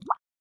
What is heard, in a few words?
running water, river sounds